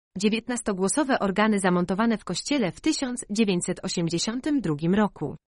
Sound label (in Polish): glosowe organy